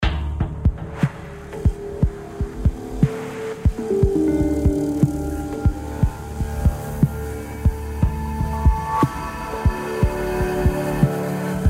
music Title; Risk of Rain

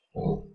guardian grunt; HLD

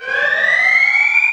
cutscene alarm; Risk of Rain

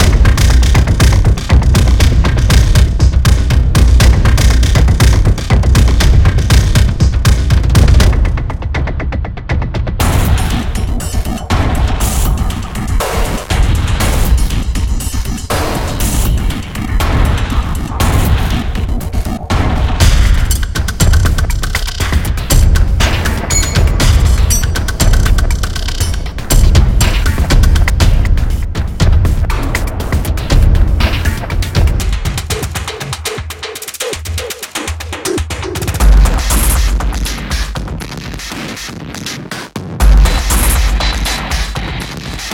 Test Song Allert; synthetik

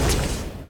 geyser; Risk of Rain

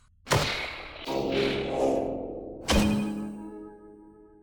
module activation; HLD

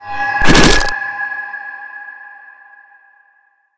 Doll; Risk of Rain

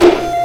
Janitor Shoot; Risk of Rain

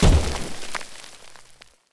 miner shoot; Risk of Rain

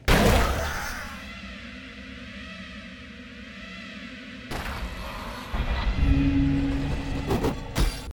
death noise; heirophant; HLD

